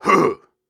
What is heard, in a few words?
Grunts and Chants, Viking Vocal